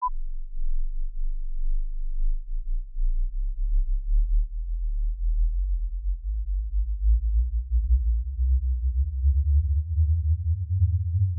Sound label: 1/3 oct pink noise sweep 20 Hz - 20 kHz